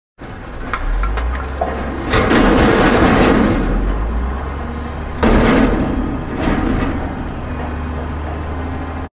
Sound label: Dump Truck Sound